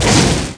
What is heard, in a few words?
Explode; ASUS; P4B533; Intel 845E ATX